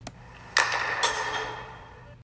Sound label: Hockey Puck